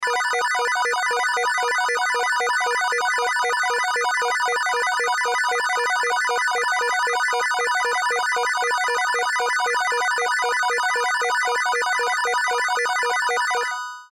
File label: Game Chime Winner; Chimes; Multimedia; Technology; Blastwave